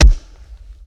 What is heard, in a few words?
Heavy Body blow punch